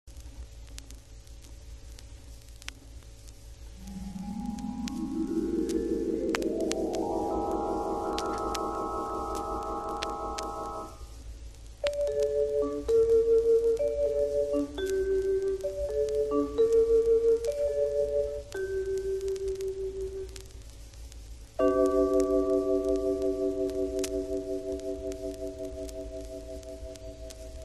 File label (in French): Gong vibraphone carillon tubulaire cloches a vache crotales Percussions